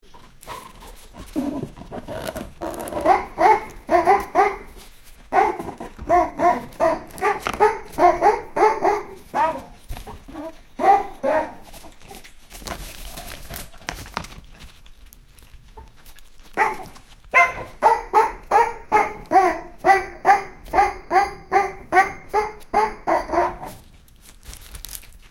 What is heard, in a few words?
an 18 year old and his